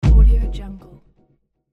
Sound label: chill electronica prompt